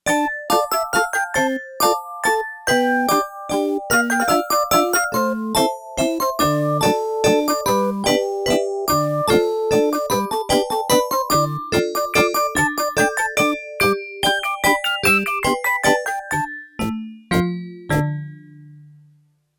Crazy Calliope, Yamaha TX802; Performances; Factory Patches

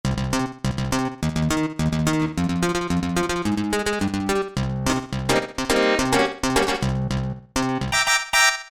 FmIsh, Generalmusic Equinox

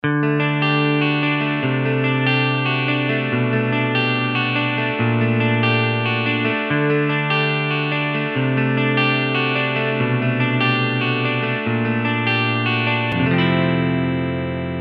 Straight Strat, Kurzweil K2000; Kurzweil K2000 Contemporary ROM

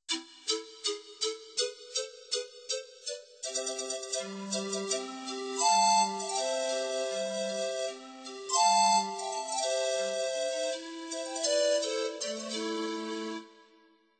pan flute, Yamaha PSR-530

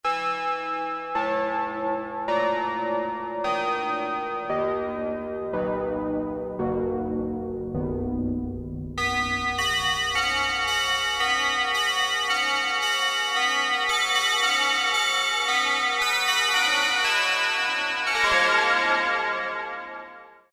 Chime, Roland JX-3P

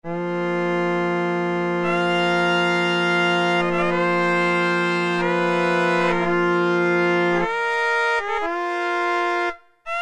Sho, Roland D-110; Factory Patches

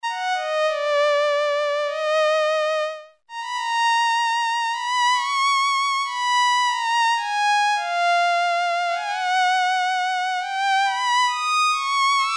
Violin (high), Roland Juno-106; Factory Preset

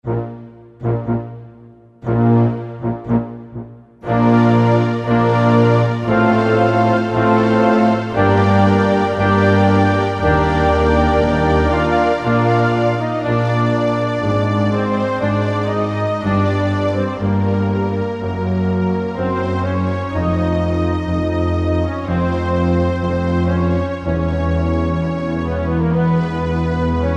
Korg N364 Orch Divisi; Factory Combi demos

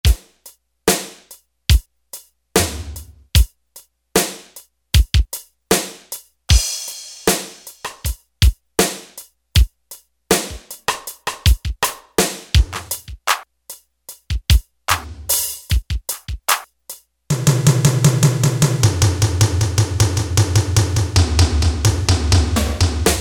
Drums Perc, Roland W-30; Factory Performance Patches

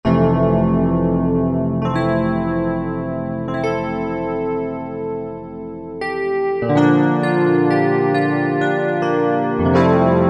Soft EP w Tine

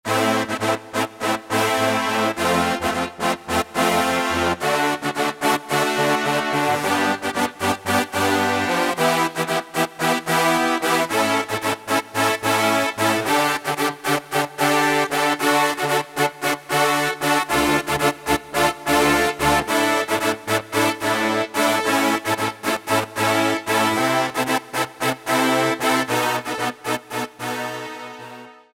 BRASS, Roland SC-880; Factory Patches; Preset; NYMAN BRASS

